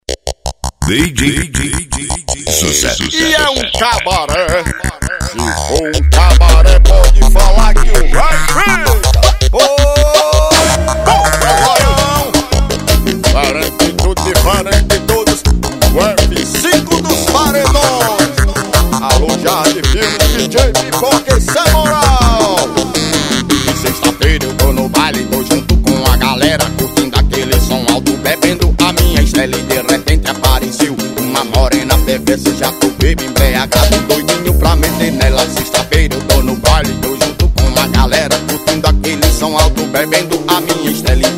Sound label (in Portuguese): piseiro